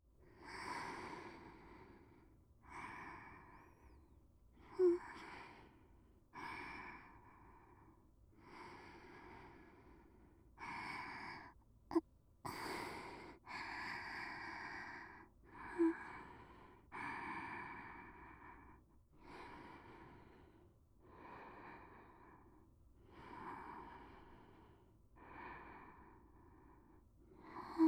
寝息; SEなし

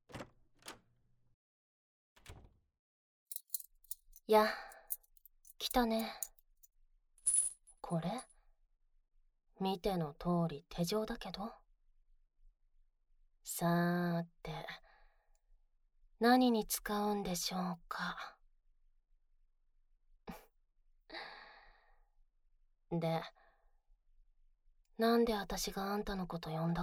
後ろから密着耳舐め; 後ろから密着耳舐め&手錠拘束&乳首責めカウントダウン手コキ

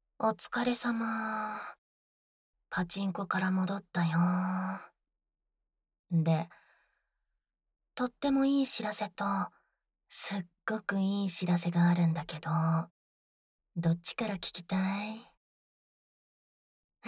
ねっとり濃厚おしゃぶり; フェラチオ

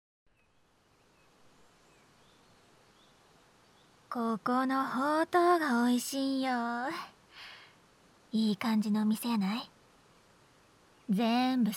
耳マッサージ; 昇仙峡

